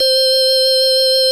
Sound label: VOX C4; Big Fish Audio; First Call Vintage Keyboards